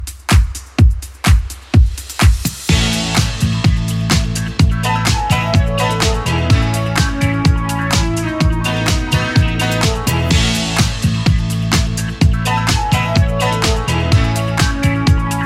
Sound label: 80s Club 126bpm CLEAN